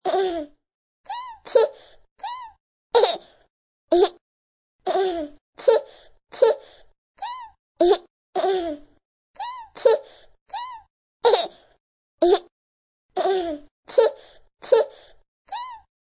Baby Laughing; Ringtone

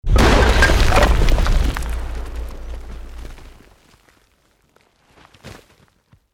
EXPLOSION MEDIUM STONE ROCK; Created explosion effects, house, building or vehicle effects; Bombs

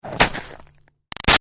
Snow Impact Stab Gritty; Weather

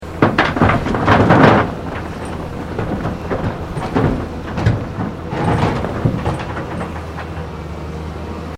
Construction Earthmover Unloading Into Dumptruck; Various construction machinery

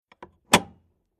Industrial Large Electric Switch Off Metallic; Switch Sound Effects; Switches

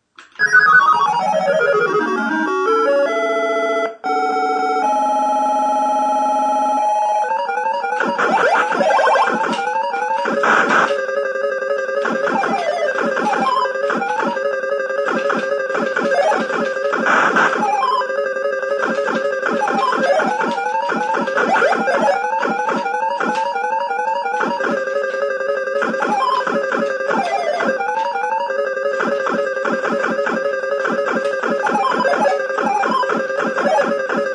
video game; Playing Bosconian video game; Video Games; Sound Effects:Games

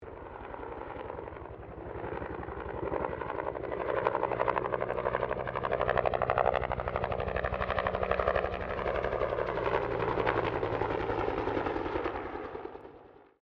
HELICOPTER 1967 BELL UH1 IROQUIOS PASS DISTANT; RAN UH-1 Iroguios; Helicopters (Sound Effects:Vehicles)

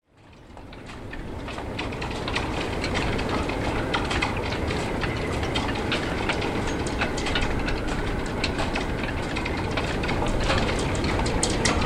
Strong Storm Wind In Rigging; Wind Sound Effects

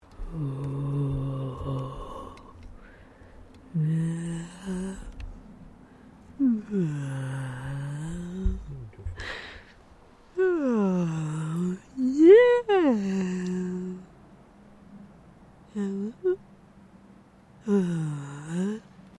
PERSON CRAZY BEING ZOMBIE; Miscellaneous Human; Sound Effects:Human; Foundation3

